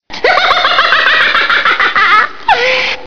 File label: Witch Cackle Halloween Sounds Car Horn